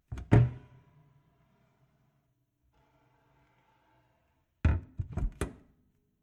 Household Domestic Kitchen Cooker Arga Opening And Closing Oven Door; Kitchen Sound Effects